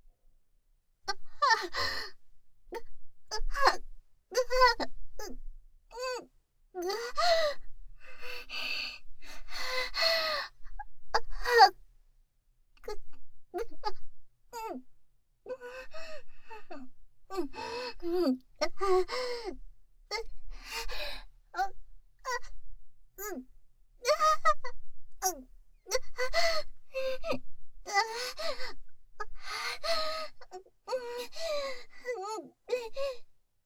少しずつ感じ始める喘ぎ

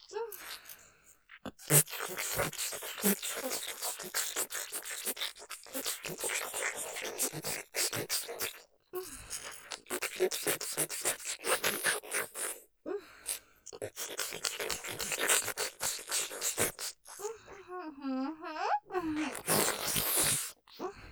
舐め音◆ちろちろ・じゅるじゅる・吐息混じりに◆音のみ; ステレオ; ループで楽しむ！シェフの気まぐれ抜き抜きコース

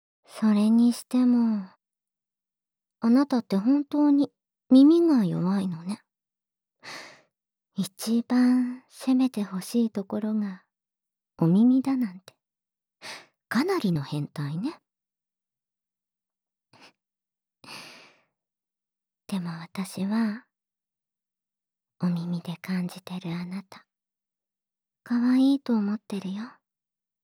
耳舐め。手コキで焦らして焦らして極上の射精タイム♪【耳舐め・耳責め・ディープキス・乳首責め手コキ】(効果音なし); 愛しさのあまり

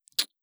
ちゅ

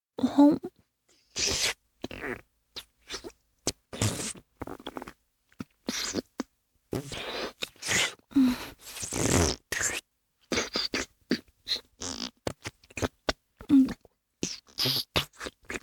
フェラチオ(中):玉袋をしゃぶる, チュパ音; チュパ音抜粋集

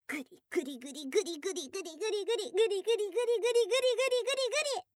グリグリ

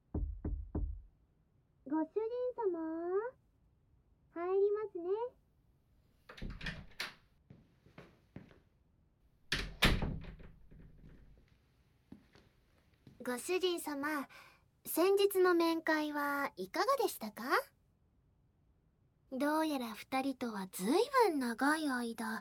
乳首舐め, ASMR; 審査報告

